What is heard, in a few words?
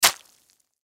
Impact Splat Wet Juicy Hit; Splats; Sound Effects:Objects; Blastwave